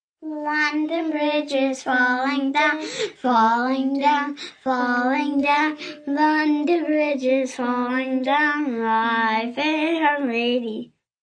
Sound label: Singing, small group, 3-4 children; kids, males, females, girls, boys,song, human voice